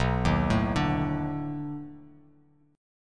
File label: B 7th Sharp 9th, down, Bass Standard; arpeggiated